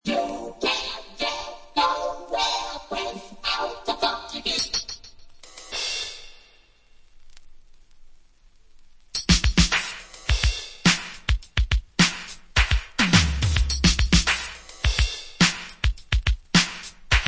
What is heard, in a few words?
funky beat